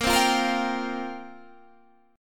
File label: F Suspended 2nd Suspended 4th, A sharp